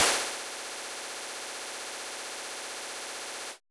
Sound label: TECHNO FX 140